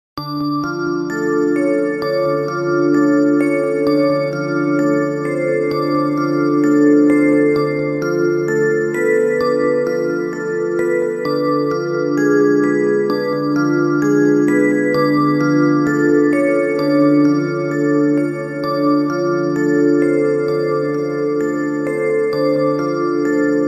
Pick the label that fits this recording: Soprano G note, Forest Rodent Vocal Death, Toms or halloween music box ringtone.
halloween music box ringtone